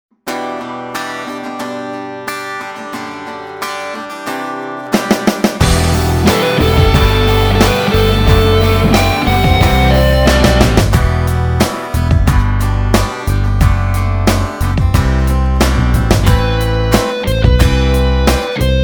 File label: Terminated, ringtones; Chinese clones; Haipai I9377 Galaxy S3 Clone